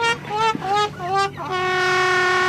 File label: fail horn